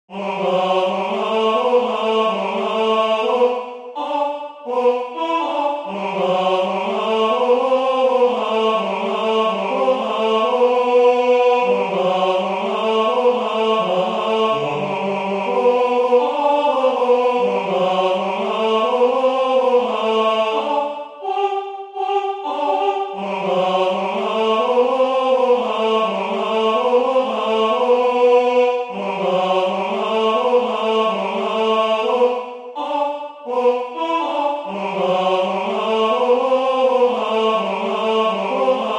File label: tenor; Tomorrow the Fox